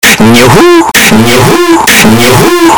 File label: nyo ho ho ho hooo